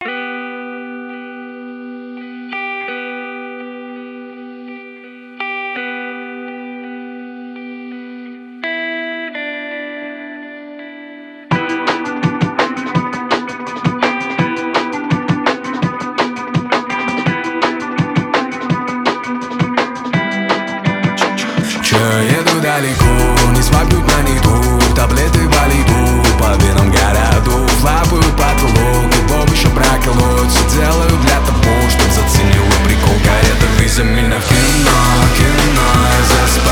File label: lofi